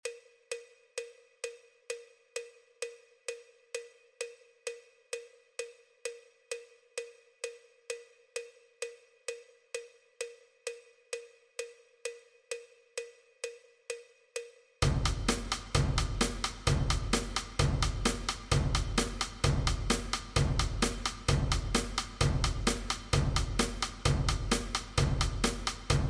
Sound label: perc